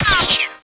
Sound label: shot